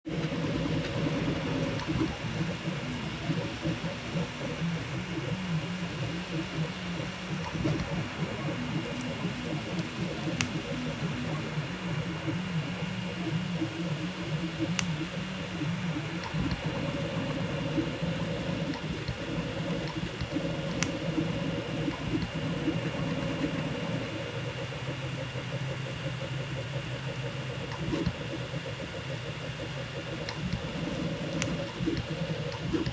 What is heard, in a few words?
Printer go beep boop